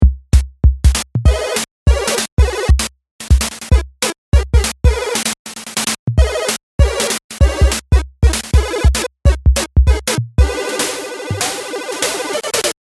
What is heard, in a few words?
Drum Chord top